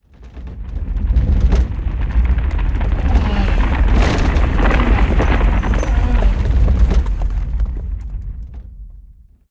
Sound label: stampede Pass; jumanji